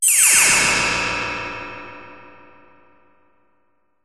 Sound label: warp